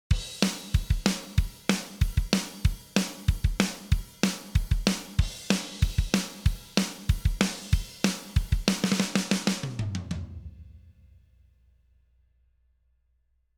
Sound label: drum off